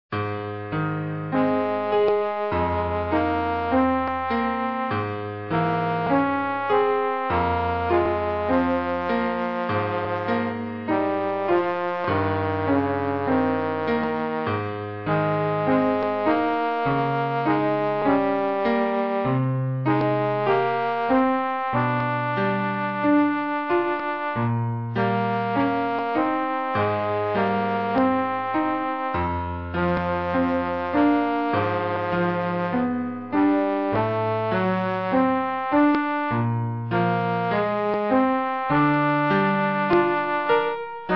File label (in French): baladin pour trombone ut et piano